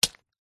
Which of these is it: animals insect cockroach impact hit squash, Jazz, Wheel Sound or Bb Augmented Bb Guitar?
animals insect cockroach impact hit squash